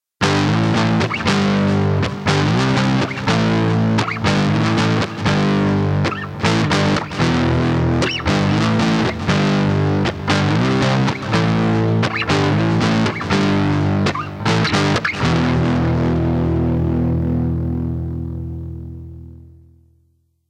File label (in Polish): gitara elektryczna; Nagrania Lokomotywy; edukacja wczesnoszkolna